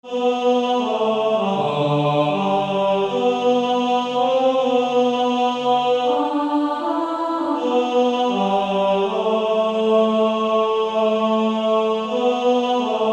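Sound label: Male Choir, tenor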